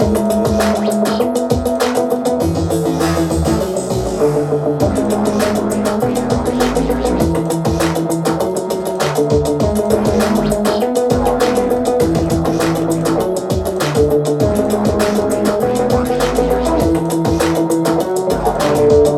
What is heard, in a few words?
loop